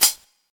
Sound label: dampened hi hat sound d sharp